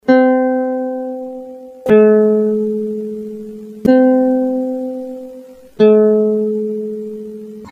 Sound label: Guitar; D n B